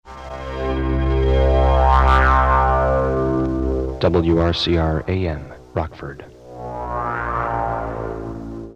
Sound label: Bumper electronic; WRCR